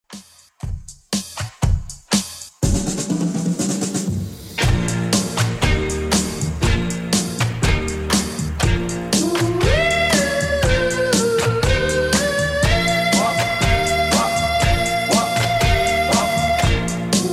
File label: 70s Redrum 120 bpm